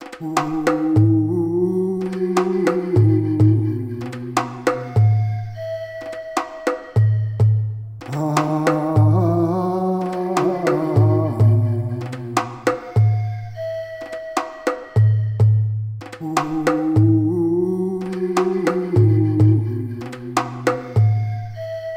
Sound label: Djembe Rythm